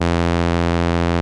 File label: ARP 2600 F3, synthesizer; Hollywood Edge - Giorgio Moroder Rare Synthesizer Collection